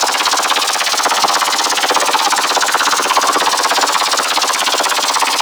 Ice Anti Freeze Generator Loop; Warframe; Corpus

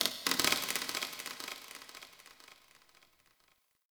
Metal Knocks Texture Small, Corpus Ship ambience

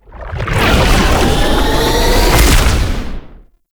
Deployment Cannon Fly; Tenno; Warframe; Hub; Tau

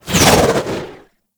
Concrete Archgun Projectile By

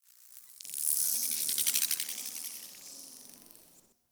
Insect Day; Eidolon Plains; Insects

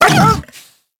Feral Vocal Pain; Warframe; Kubrow

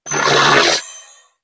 Surprised Female; en; New War; Narmer Grineer

